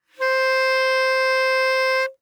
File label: Bass Clarinet ff C5 stereo; Woodwinds